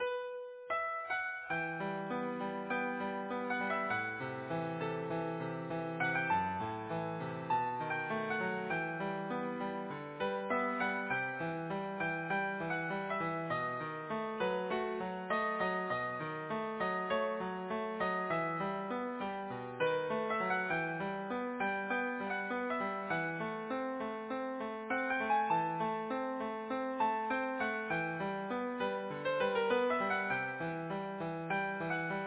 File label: Easy Piano